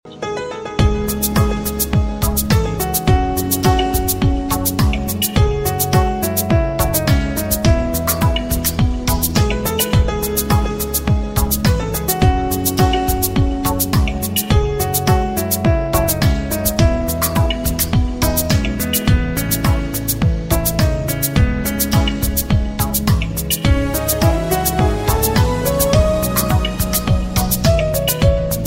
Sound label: love tone, Love Tones Ringtones